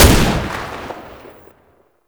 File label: Fire, Weapons; Warframe; Scaldra AK